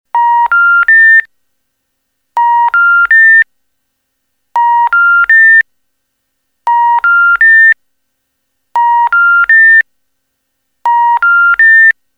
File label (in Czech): ton neexistujiciho cisla